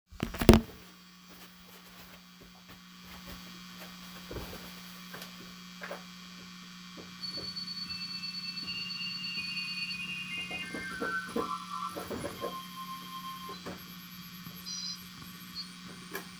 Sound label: black ambient